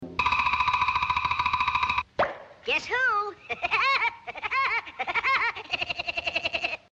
dyatel probivaet dver zvuk vylezshey golovy i smeh

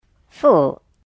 multyashnoe fu

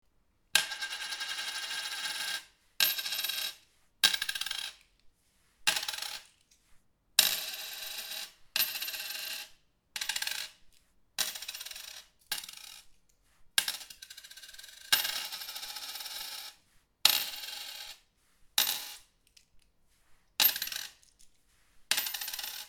moneta na stekl stol

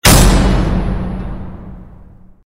dver metallicheskaya udar

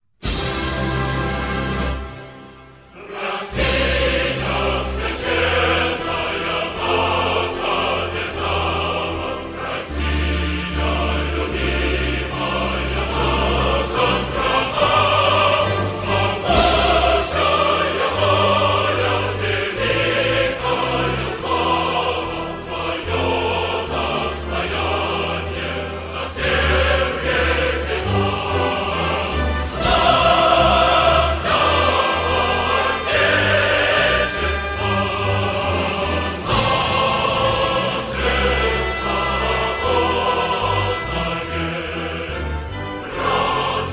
gimn rossii slova

